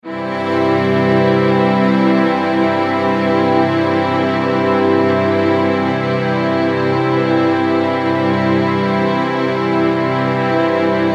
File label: C chord ET